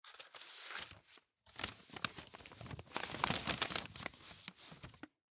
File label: Open An Envelope; LOOPERS